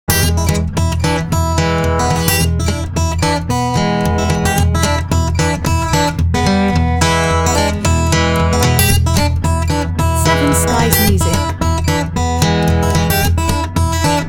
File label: The Guitar Player